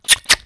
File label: chic chic